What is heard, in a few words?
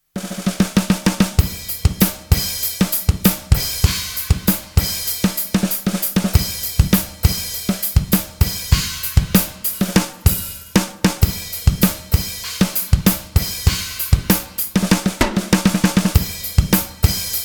Drums; SuperDrumFX; Demo wurde Live mit meinem Roland/DIY E-Drum eingespielt; Mit gesampelter TD6-Snare